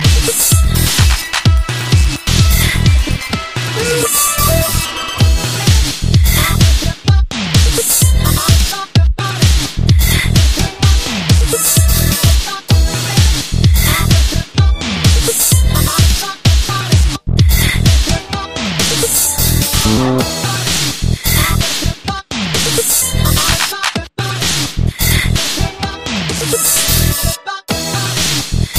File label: Synth Remix